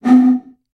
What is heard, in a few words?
Blow Pipe, bottle